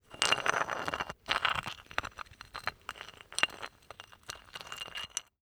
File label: ICE Thin Scrubbed Blocks Friction; Articulated Sounds